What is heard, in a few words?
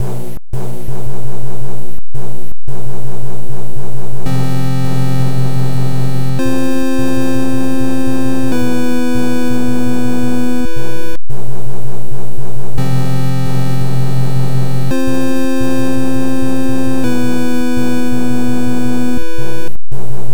nes airship background; super mario bros 3